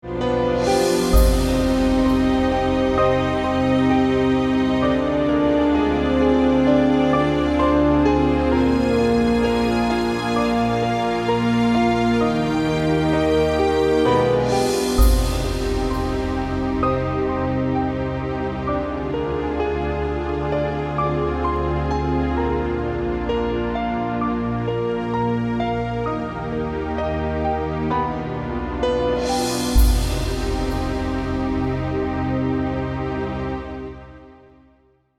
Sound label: Synth; Drama